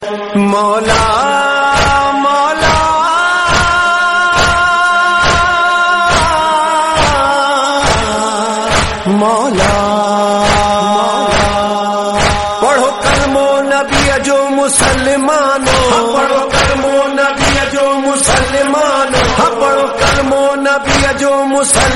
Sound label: noha tone